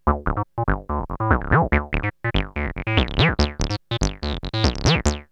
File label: 303 Loops; X-Static Goldmine